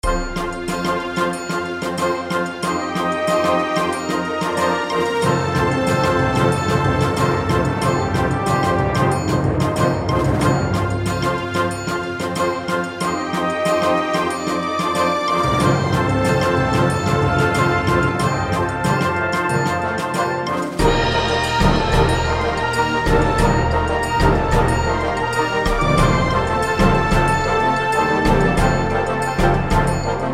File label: bgm